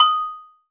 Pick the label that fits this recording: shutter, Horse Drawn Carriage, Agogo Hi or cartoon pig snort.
Agogo Hi